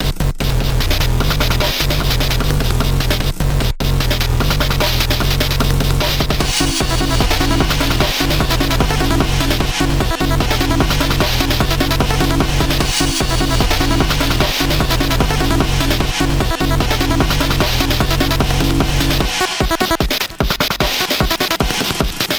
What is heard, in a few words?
Instrumental; Phase 6